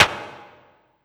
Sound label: PERC, 160 BPM, Drum & Bass